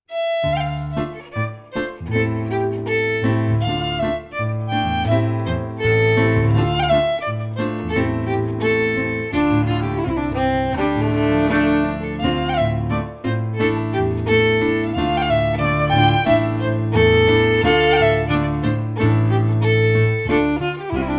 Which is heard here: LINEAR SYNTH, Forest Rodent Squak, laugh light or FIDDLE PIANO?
FIDDLE PIANO